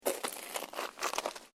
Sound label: SNOW CREAK; Material components for constructing more complex sounds; Snow (Sound Effects:Weather)